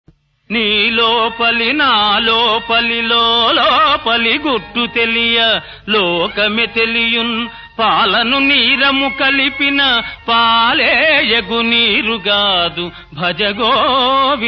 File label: Padyam; Appu-Chesi-Pappu-Kudu